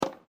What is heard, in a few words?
bounce